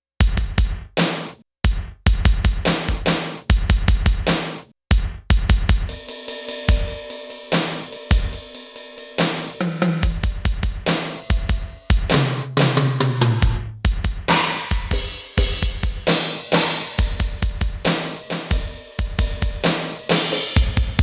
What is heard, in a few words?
Gated DR